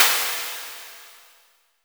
Cymbal

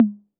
Tom Hi B 808, Clean, Digital

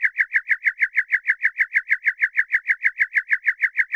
Chirp FX DX100 D3; birds

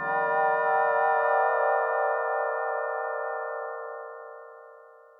PAD Scifi Plains Heavenly

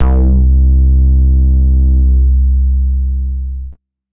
90s club bass C3

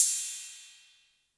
Cymbal 808 Tape Decay, Clean

